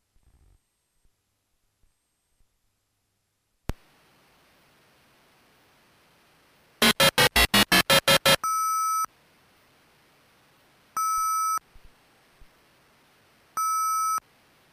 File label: modem 300 baud